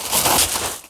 Bodyfall Gravel; SFX Kit; Bodyfalls

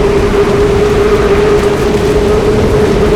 Tornado Loop; Destruction

